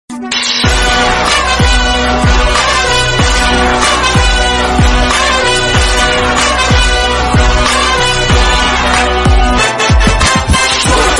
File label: BGM, Tamil Ringtone; Vikram